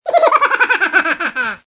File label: Evil Laugh; HalloweenPack